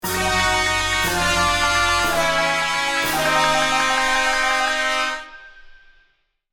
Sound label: Comedy cartoon wah wah wah fail trumpets